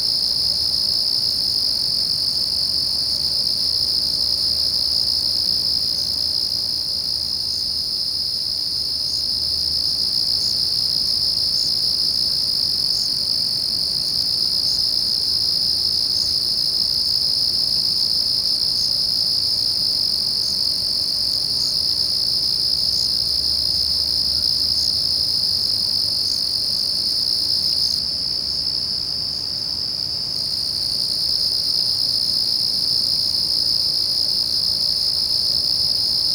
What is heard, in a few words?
Crickets near lake; Inquisitor 3